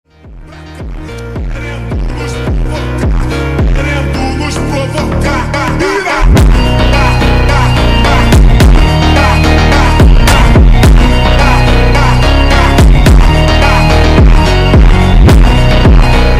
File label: Funk slowed reverb